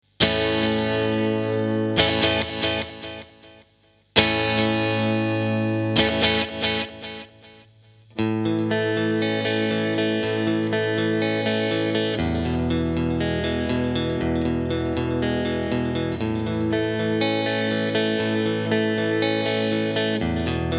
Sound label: dd-3 mod スイッチ中央